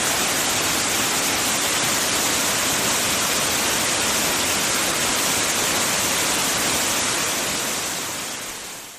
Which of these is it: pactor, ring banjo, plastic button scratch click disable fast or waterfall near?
waterfall near